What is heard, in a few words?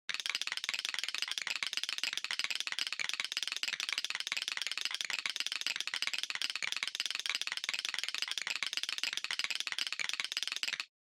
Motion Tremble Long